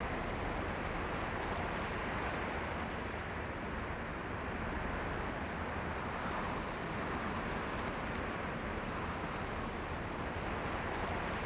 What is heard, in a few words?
wind desert; ambient; World of Tanks Blitz